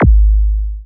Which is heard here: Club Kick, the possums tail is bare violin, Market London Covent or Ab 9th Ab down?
Club Kick